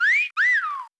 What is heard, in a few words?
Wolf Whistle; Ed Edd n Eddy; Adromulus Pack; EEnE